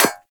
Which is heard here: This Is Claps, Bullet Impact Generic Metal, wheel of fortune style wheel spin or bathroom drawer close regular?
Bullet Impact Generic Metal